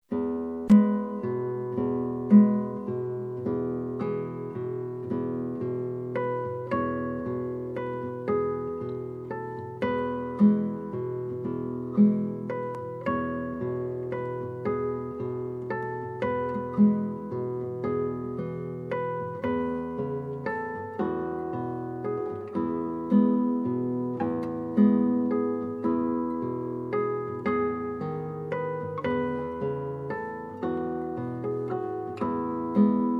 Classical

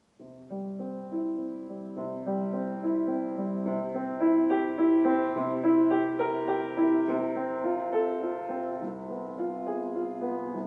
Unity Candle Music, Strings

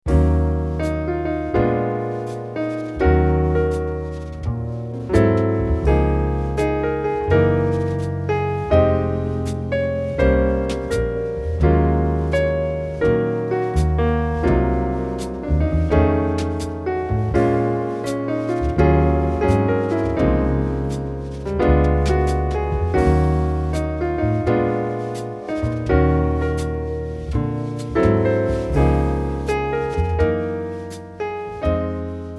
One Man Band, Piano

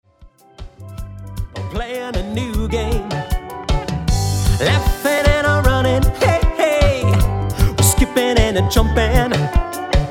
live band; Islands in the sun productions

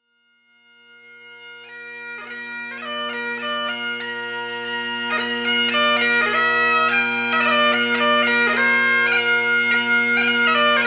Bagpipes Traditional march; Folk

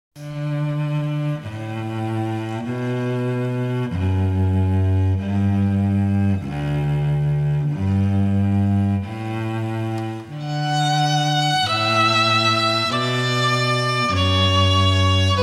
Canon in D String Quartet

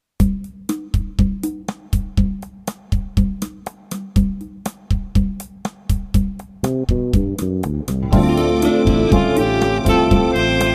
saxophone

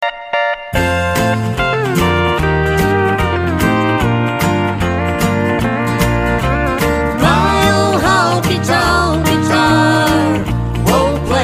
Honky Tonk Guitar; Country

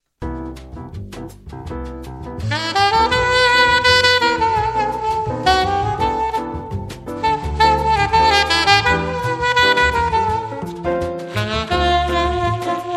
Meditation Sax; Acoustic Duo

